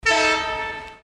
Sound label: Horn; UTS PRO; Driving System